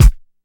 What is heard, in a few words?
rap bass drum sample b key, Royality free kick sound tuned to the B note; kick drum samples